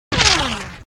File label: bullet, combat target